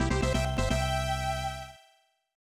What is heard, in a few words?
Catching a Bass; TNN Bass Tournament of Champions; SNES